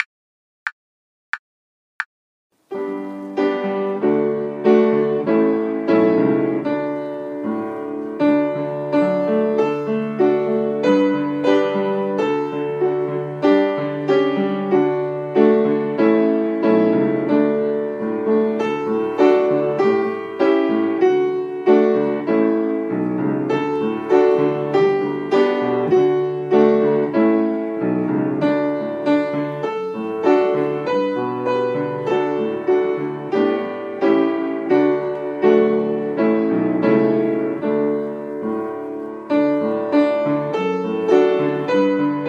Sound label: Twinkle, carols backing track